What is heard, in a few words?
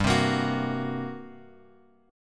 G Minor Major 7th Double Flat 5th, G; GmM7bb5 Chord {3 3 X 3 X 2} strummed